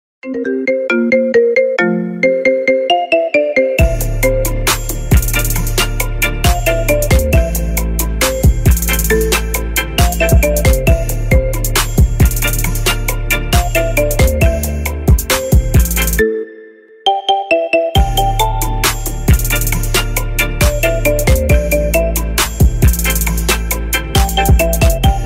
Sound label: iphone remix ringtone